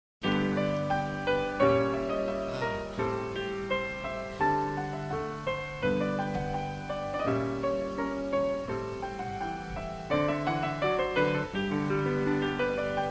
Music

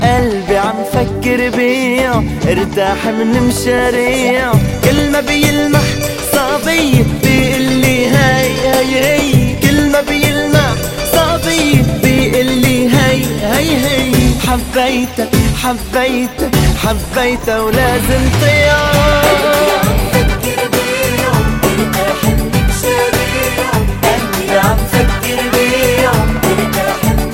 Arabic Love

